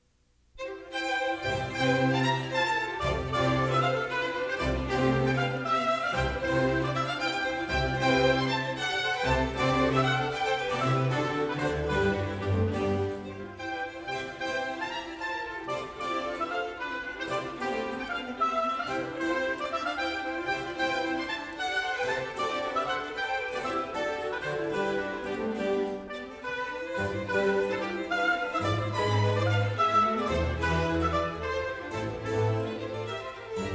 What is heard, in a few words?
Bach Orchestral Suite No1 BWV1066; Scherchen